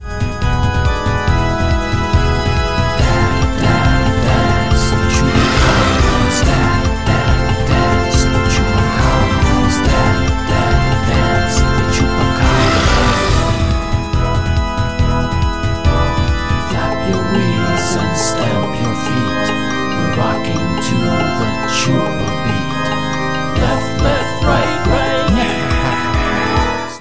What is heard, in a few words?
Jig Ringtone Chorus